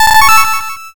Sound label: fanfare